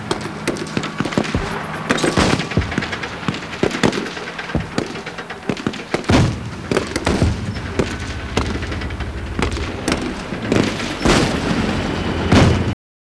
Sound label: battle mix right; ambience